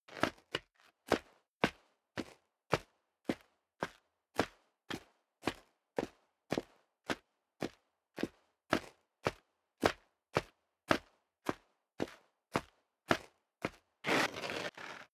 step leather shoes concrete grit upstairs sequence; schritte